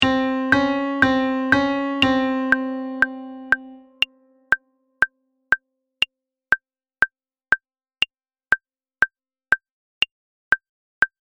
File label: Minor 2nd